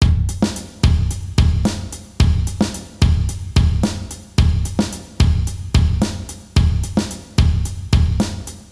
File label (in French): rythme, batteur